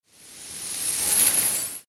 iron chain

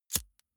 lighter wheel switch on flame

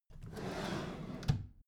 empty drawer smooth open soft

